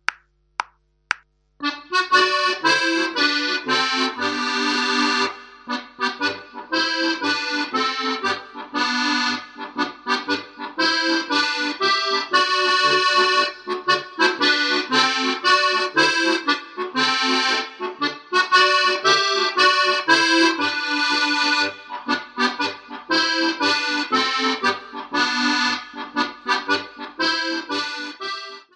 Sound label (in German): Polka (1. Stimme)